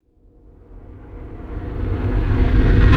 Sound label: processed reverse reverb swoosh buildup